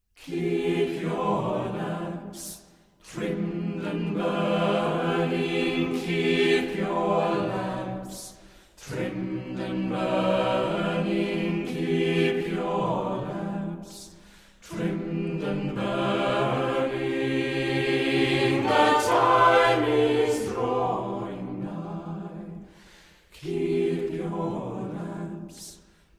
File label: Keep Your Lamps Gospel Spiritual